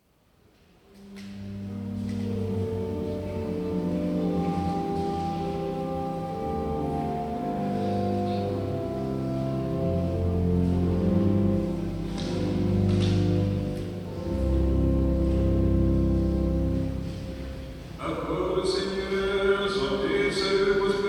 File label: benediction in russian, eglise saint medard